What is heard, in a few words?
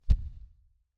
bell Height Lock; Desert SFX